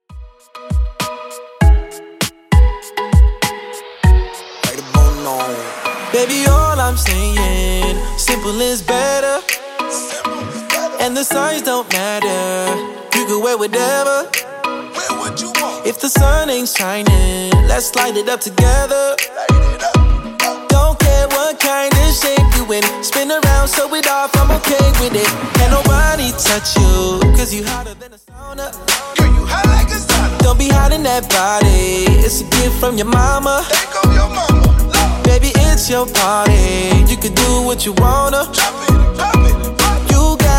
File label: 2ks DJ Friendly Redrum 99bpm